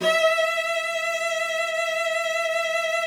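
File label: cello vibrato, E5; Xsample VOL-7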